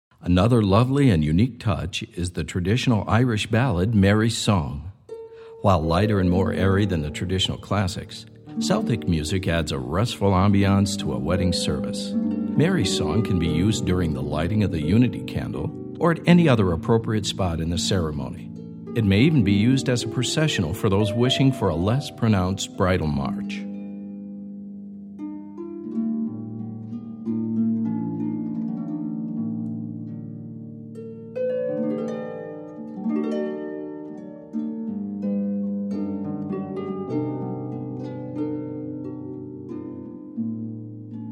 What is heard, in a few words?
Traditional Irish, Harp